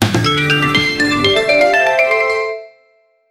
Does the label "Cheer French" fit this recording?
No